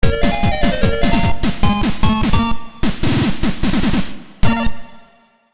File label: game over; rhino rumble; JTel